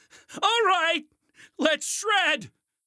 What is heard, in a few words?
snowboard; fusty snowman matchup